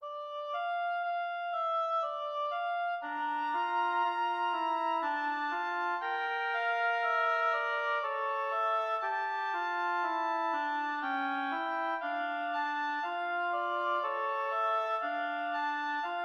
easy oboe duo in D minor adagio; kalliwoda

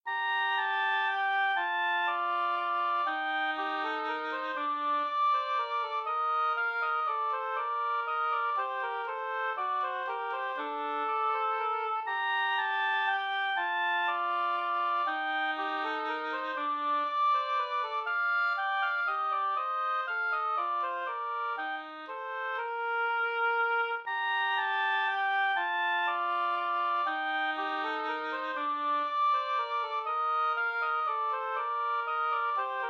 minuet oboe and oboe

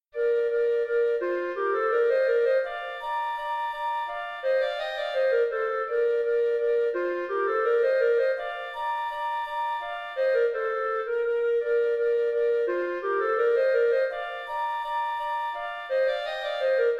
rondeau flute clarinet duet; chedeville

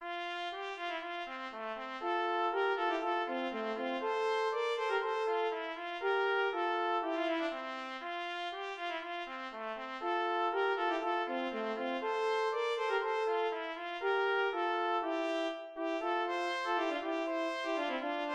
allegro tpthorn duet; handel

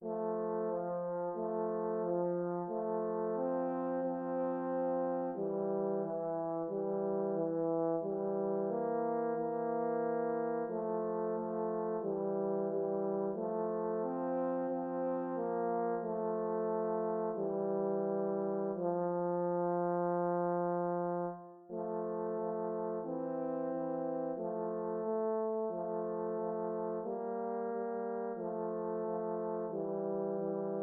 beginning horn duet in c major

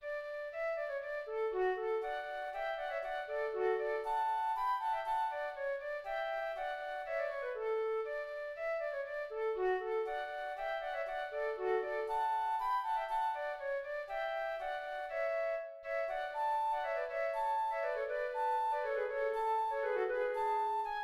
allegro flute duet; handel

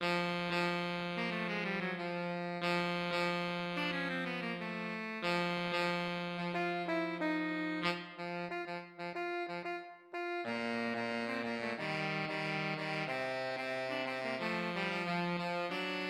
jazzy alto sax tenor sax; we wish you a merry christmas

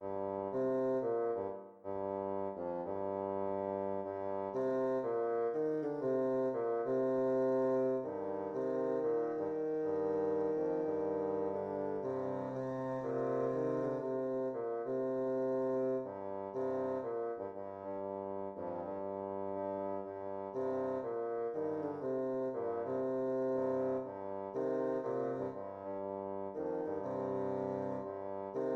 jazzy bassoon duet teacher student

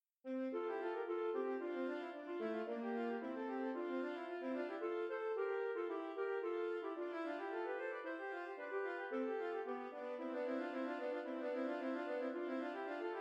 allemande alto sax duet; mattheson

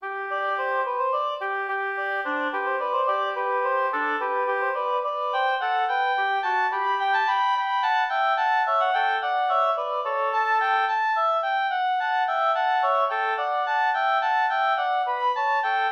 gigue in g major, 2 obs; romain

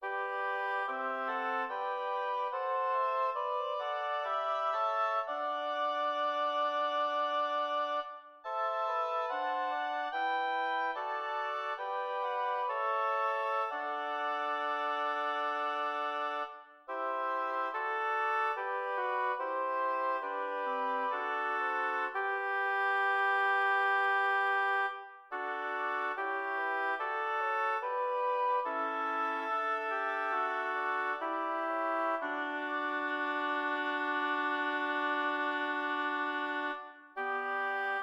lyrical oboe quartet

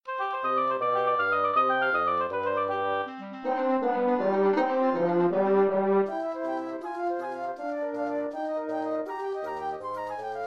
presto for quintet

